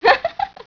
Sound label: notify